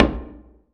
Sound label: SURDO; AKAI S-Series CD-ROM Sound Library VOL-5